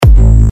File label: Big Room Kick A